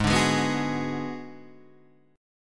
G sharp Minor 7th Flat 5th G sharp down Guitar Half Step Down